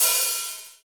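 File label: HH, hi-hat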